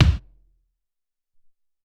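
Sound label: SR16 Kick 1, Alesis SR-18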